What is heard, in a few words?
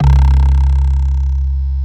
Bass Analog & Synth, C2; GLAVIOUZ; USB Soundscan vol.12; AKAI; VINTAGE